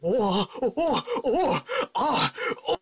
die; votemap; kani

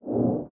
move

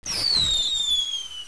fireworks

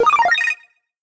spawn, buttons; digilab

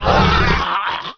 die; unreal; skaarj

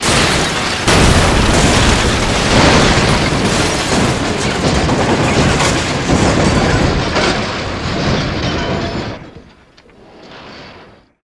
start crash

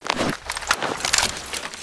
bomba

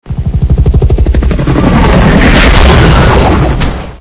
crashed helo, ambience

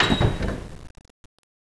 blip

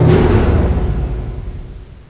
big stop, plats

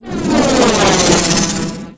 alien flying

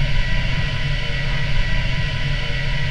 steam big, ambience

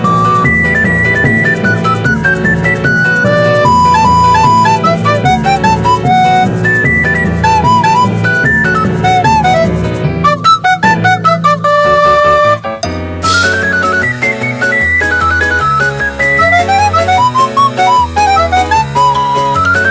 recorder training

